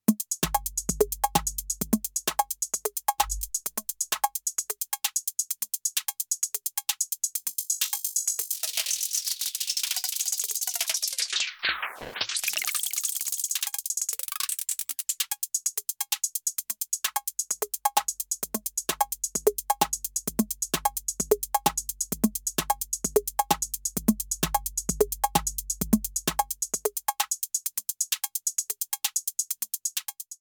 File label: Erica Synths Black Hole DSP2 HP Filter